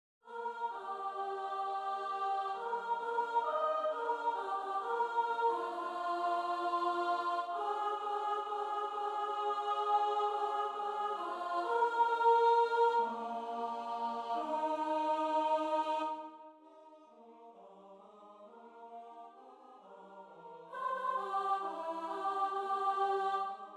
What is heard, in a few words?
altos; as dew in aprille